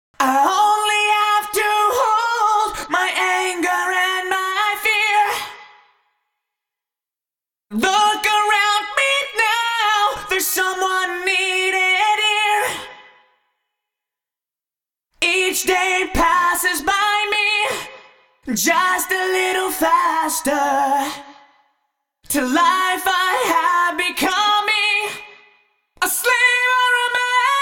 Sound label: Vocals Ursa Major Space Station Rev Long; Fatty